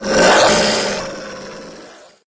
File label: growl envelope test, antlion guard; hl2